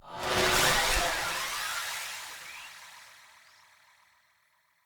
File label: Soul Steal Power